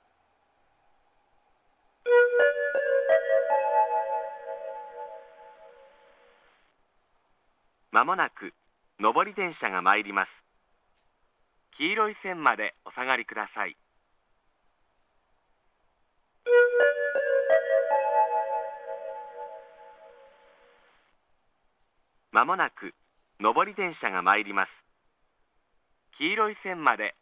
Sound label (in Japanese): nobori sekkin; atsugi